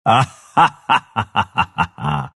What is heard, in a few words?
pangolin laugh; dota